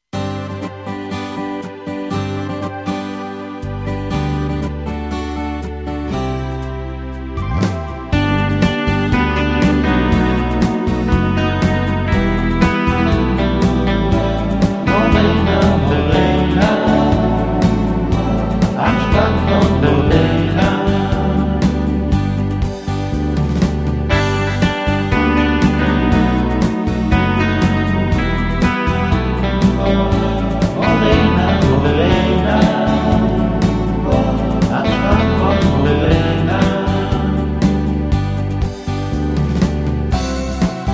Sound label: Karaoke